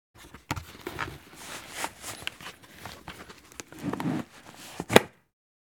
Motorcycle helmet putting on

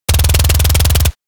Tactical submachine gun short burst

